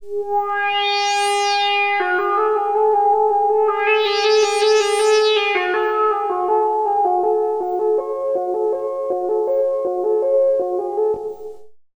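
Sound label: synth, Drum & Bass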